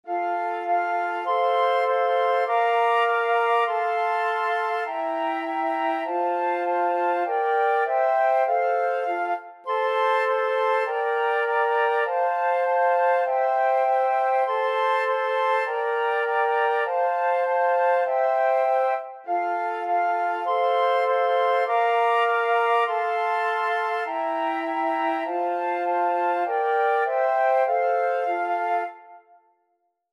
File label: flute quartet